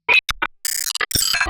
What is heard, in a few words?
glitch interface crunchanator; SoundMorph; Users of Tommorow